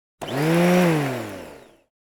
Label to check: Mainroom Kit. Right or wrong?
Wrong